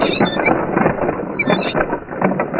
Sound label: ROLLING RUG